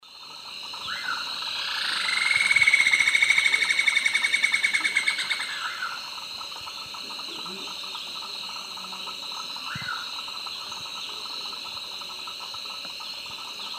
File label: Dendrexetastes rufigula, duet and calls; S. America; Eastern Lowlands